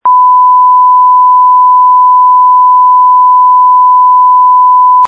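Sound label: Continuous Tone Toxic Gas Alarm 1kHz signal; PAGASYS; PFEER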